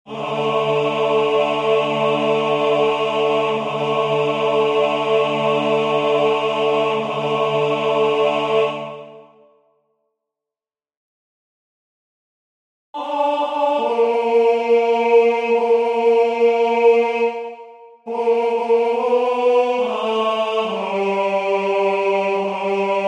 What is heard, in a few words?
Bas; The King shall Rejoice